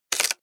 Splitting Wooden Crate With Hammer Crowbar Tearing Splintering Short; Wood Sound Effects